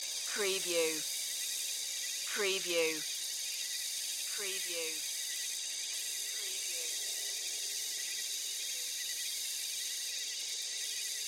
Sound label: Ambience Jungle Night Loop